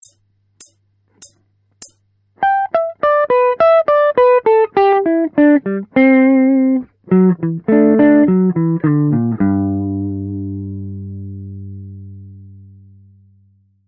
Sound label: blues